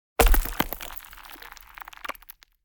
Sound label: Ice, Impact, Medium, Slide; Mattia Cellotto; Glacier Ice